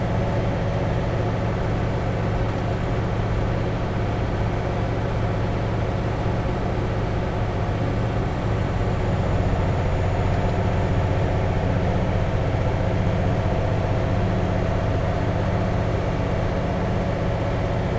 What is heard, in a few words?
DRIVE CABIN; SIMUZENSOUND